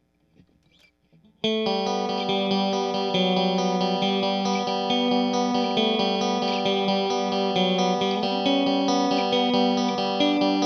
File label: strat channel 1